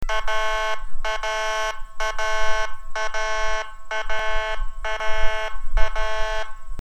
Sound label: Pulsed Airhorn, siren; whelen